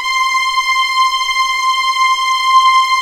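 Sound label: C6 legato F L, string; East West; The Ultimate String Collection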